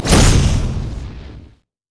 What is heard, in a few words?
twinbite dragon; LoL; shyvana